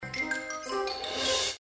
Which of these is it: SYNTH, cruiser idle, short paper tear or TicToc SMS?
TicToc SMS